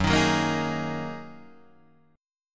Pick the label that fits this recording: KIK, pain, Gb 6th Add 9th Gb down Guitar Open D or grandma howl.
Gb 6th Add 9th Gb down Guitar Open D